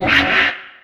Menu Cancel; Menu FX

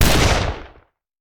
Rifle; Sound Ideas; Guns

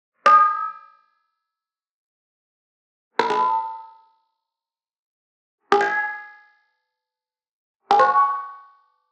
Arcade Click Neutral; boom library; The Interface

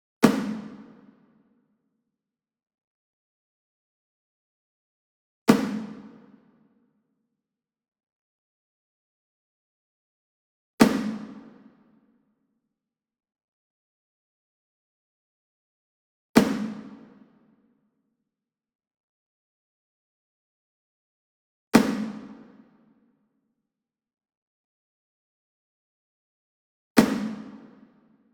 SINGLE DRUM Shime Daiko Hit Medium; boom library; Cinematic Strikes Construction Kit

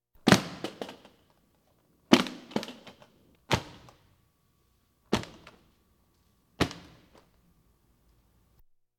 Police, Fire engines

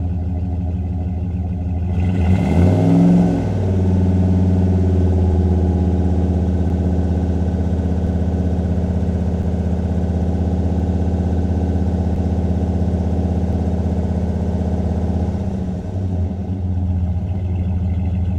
Truck Dodge Ram Fast Idle; Trucks

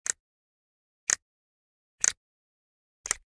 Generic Click Wood Tiny

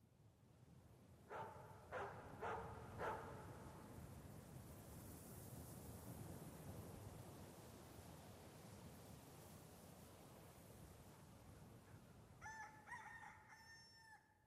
Cowboy Ambi; Pitch Black Audio Pong

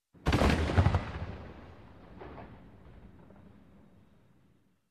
Cannon Explosion; Sound Ideas 2000 Series

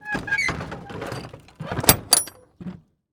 Semi Trailer Door; Large Vehicles; Blastwave

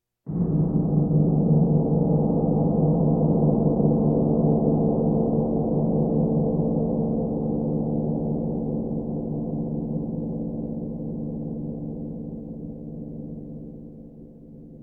Percussion Bongos Tympani rolls Gongs Drums

